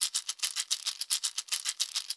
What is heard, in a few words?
Ice Dices Shaker Loop 110